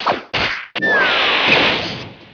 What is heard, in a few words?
smack down